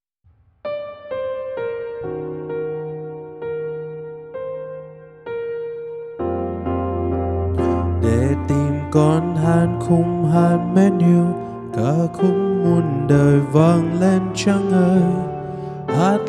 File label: bass